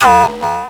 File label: Multimedia Internet CD-Rom Flash Hi-Tech